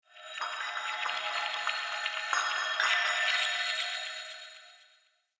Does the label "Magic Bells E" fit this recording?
Yes